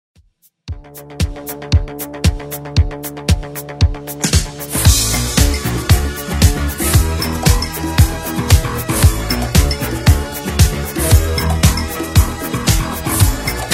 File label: 70s Redrum 115bpm